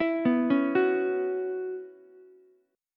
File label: B Suspended 2nd Suspended 4th, Ukulele; Bsus2sus4 arpeggiated; JGuitar